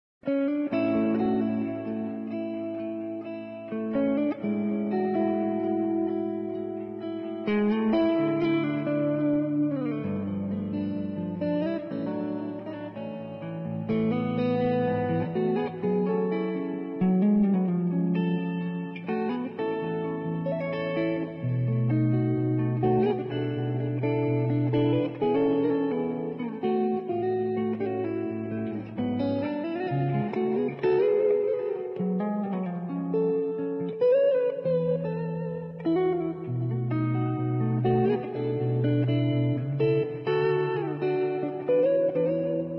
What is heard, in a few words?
Guitar Electric Meu Prazer; Instruments